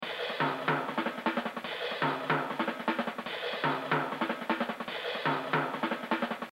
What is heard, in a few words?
Exercise, jazz drumming; Mel Lewis; The Evolution of Jazz Drumming (Gottlieb)